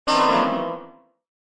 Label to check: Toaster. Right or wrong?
Wrong